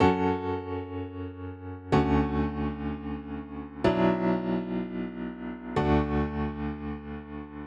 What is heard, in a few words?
wave